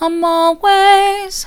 i'm allways soft, vocal

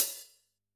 Hf Hat, drum

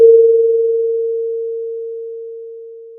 Sound of a church bell

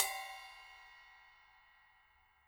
Trash

